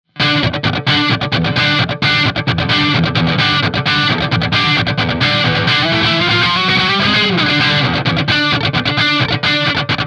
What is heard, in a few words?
funk; ampvergleich